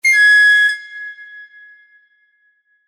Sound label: correct; 正解５２（和風のピンポンの高音ver）